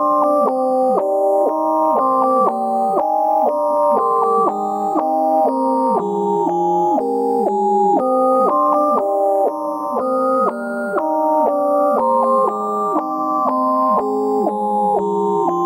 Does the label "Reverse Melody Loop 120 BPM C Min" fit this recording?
Yes